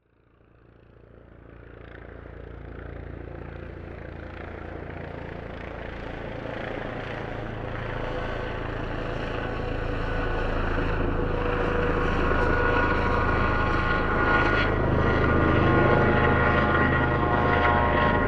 transport helicopter approach fly overhead and away